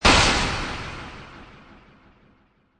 Magical Blast Delay, Magic sound of casting spell, conjuring or wizardry